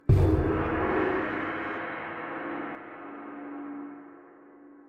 minigong

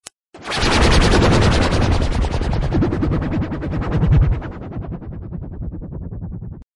call; mandron

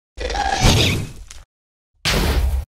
ghast sound

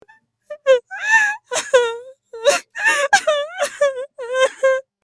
cries

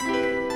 mobile beep sound; cherry mobile engtest sample

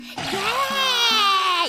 yay

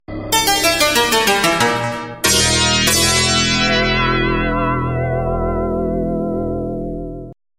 synth end

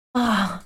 moan

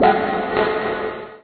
horror sting

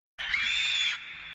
embarrassing scream

